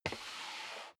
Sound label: step sneaker wood creak slide long; schritte